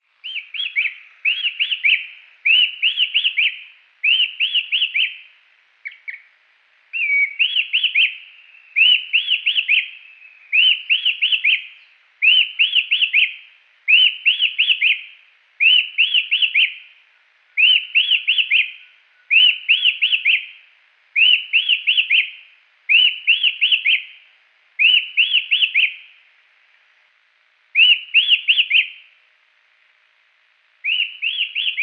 Attila phoenicurus song; Salerno

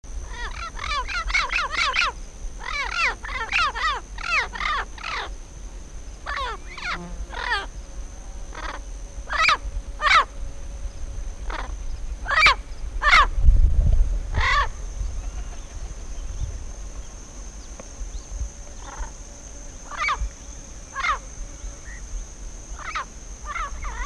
Amazona aestiva

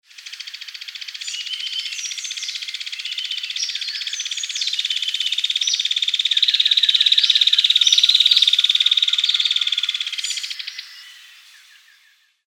Sittasomus griseicapillus; Est Golondrina

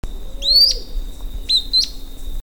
Synallaxis cinerascens call

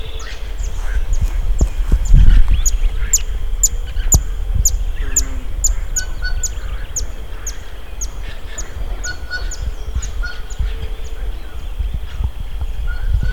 Chaetura cinereiventris

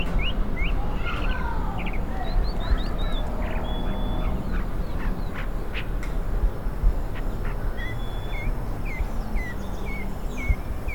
Mimus saturninus complex song

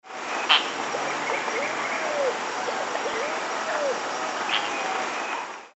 Tityra semifasciata, Forest; Yaguarete

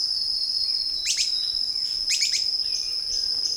Platyrinchus mystaceus alarm

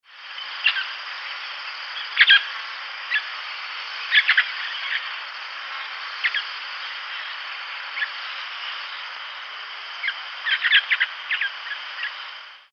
Pionus maximiliani; Estancia Golondrina

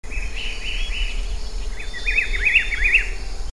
Cyclarhis gujanensis; Two birds giving different calls answering each other; recorded PROCOSARA, PN San Rafael; Paul Smith March 2007

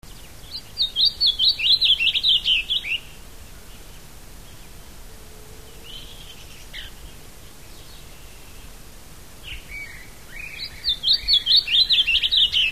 Geothlypis aequinoctialis

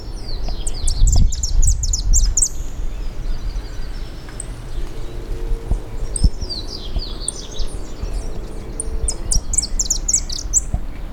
Sicalis flaveola song

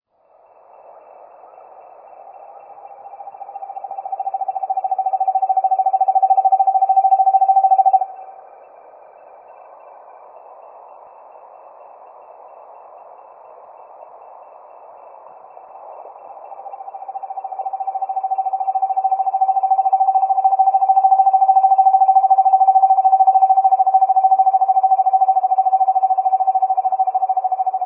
Megascops atricapillus

